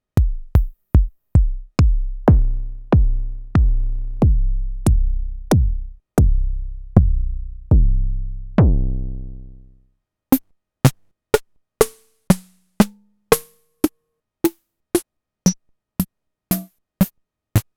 Modular kicks and snares

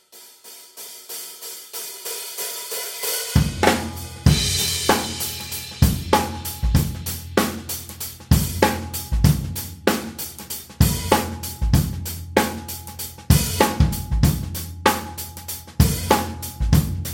bass line and drum chunk; from different youtube finds; hip hop beat battle; loosely rock themed

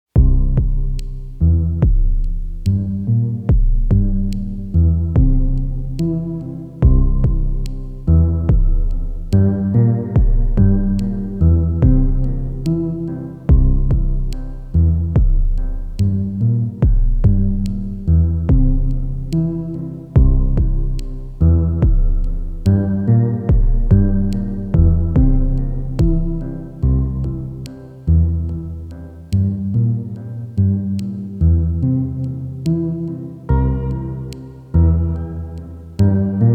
ST and A4

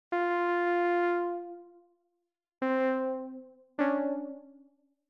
A tone from my mynth app

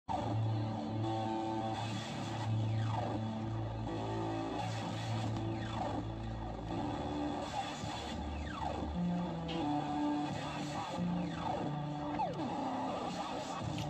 Casio feedback, Serge VCS, doepfer switch

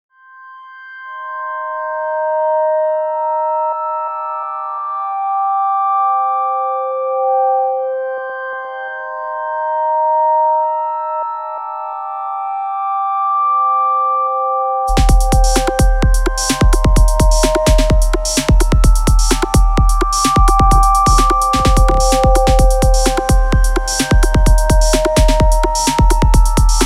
more dream/nightmare workouts

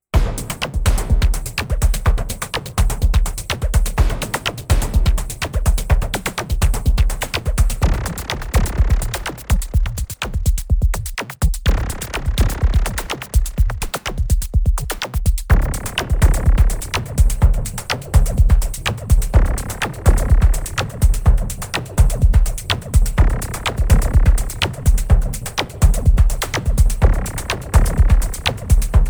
These old-school electro breaks; old-school electro breaks were obviously inspired by four gentlemen from Dusseldorf

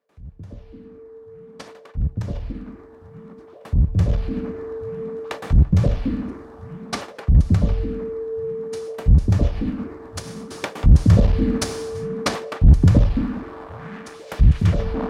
pulsar ornament octatrack

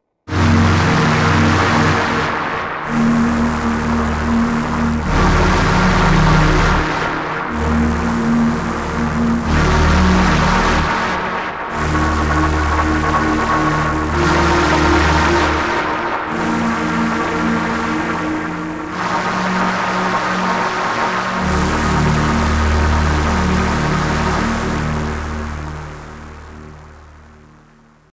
Swarm; Syntakt; polyphony

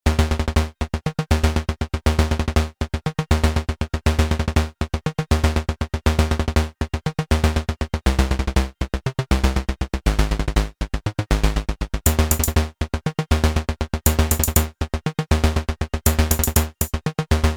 Rev2/M32, instrumental version; as for now the Rev2/M32 share the same settings and I can’t have reverb/delay when the Rev2 kicks in (so it is quite underwhelming on the 1st chorus); there should be vocals on top but this is the instrumental version

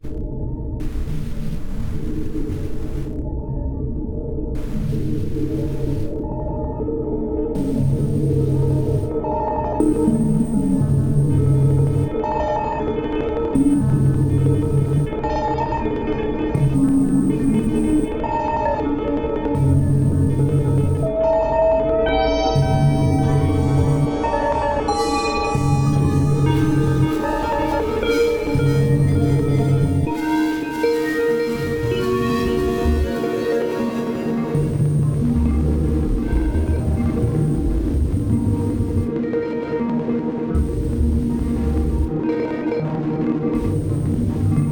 DN + OT ‘granular’

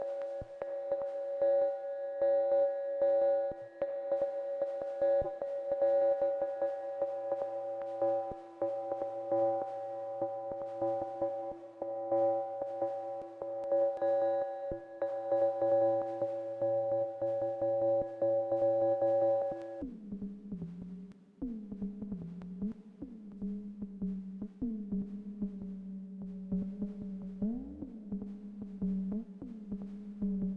Karplus Strong, -style beat; FX track; then on a whim stopped the sequencer and started trying to walk the line between the delay

